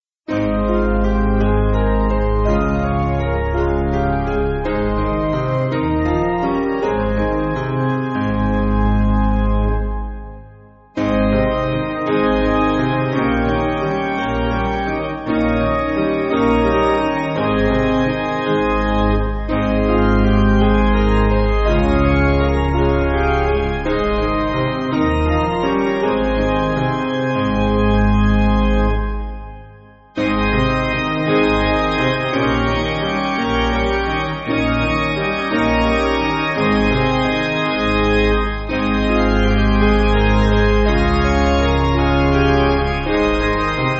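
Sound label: Piano, Backing Files for Churches; He Is Mine; Bennett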